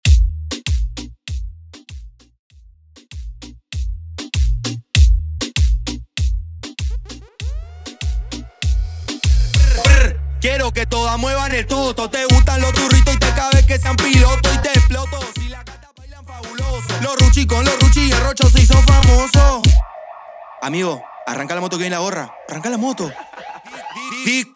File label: Intro Break 98 Bpm; Prodeejay Remix